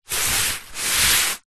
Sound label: sport exercise mat foam hand rub